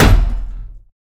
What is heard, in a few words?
Door Hit Kill; MiSide